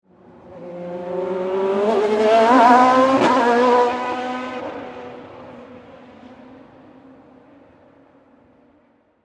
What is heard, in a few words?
Race Car Pass By, Sports, Grand, Prix, Race, Car, Pass, By; Race Cars (Sound Effects:Vehicl; BlastWave